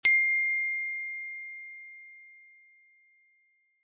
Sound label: sms alert note; Umidigi F1 Play; ui